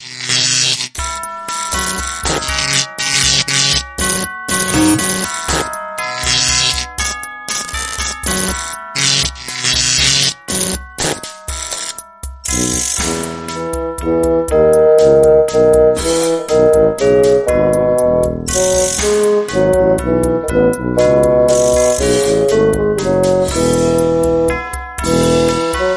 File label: We Zap You A Merry Xmas; Trad (PD)